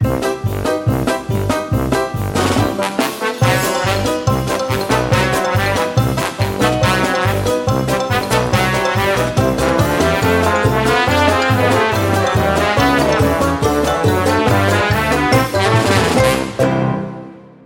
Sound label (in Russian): klounskaya perebivka; Звуки и музыка цирковая